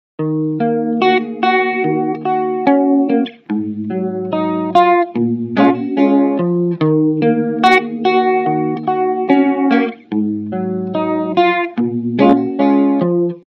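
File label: warm univibeish, guitar wet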